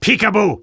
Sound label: boo; spy